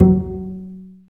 STR CB JZ, Cb JazzPizz

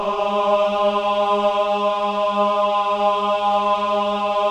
CHOIR AHS, AH G#3; Spectrasonics; Symphony of Voices

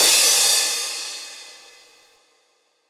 Crash, impact samples

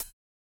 Cl Hat, 140bpm, Grime; Zero-G Sound Sense Grime Scene; Construction Kits; Its Primetime

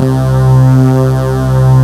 HOT FUZZ, TECHNO; Pro-Rec - Synthsonic; Z1 TECHNO

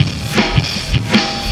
System Overload Beat, Processed Drumkits

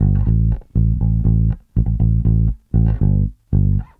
Bass, 70s Analog Funk

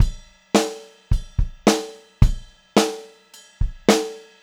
POP VARI, Pop-Rock Drumloops; 108 POP

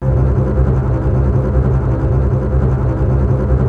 TREMOLO C2, TREM STRINGS; Greytsounds VOL-1

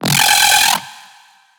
BASS SHOT

